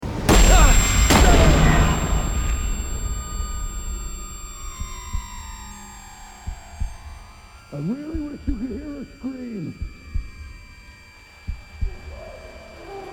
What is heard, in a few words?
tinnitus